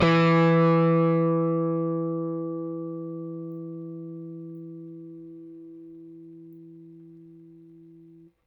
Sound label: Rickenbacker Bass; Airwindows